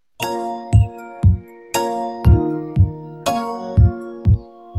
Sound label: ring delivery, music